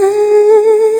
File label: huuueeueueue; Truth-Hz - 1-Hit KO Sample Pack